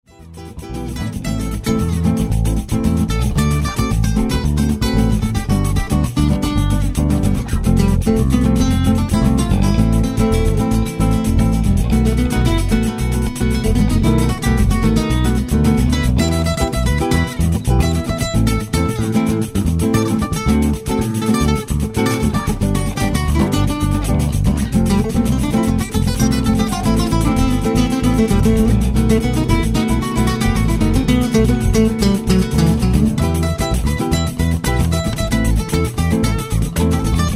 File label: Acoustic percussion Latin